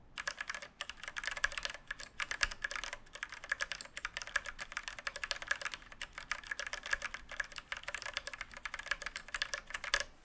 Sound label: cherry mx red; mechanical keyboards